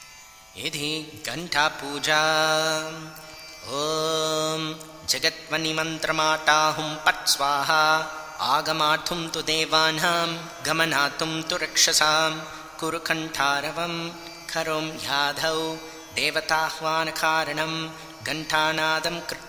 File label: ghanta puja; Ghanta Puja – Spirtual; puja vidhanam; pancha upachara puja